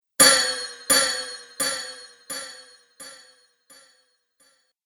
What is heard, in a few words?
Mangled Metal Impact; Miscellaneous Impact; Andrew Fleming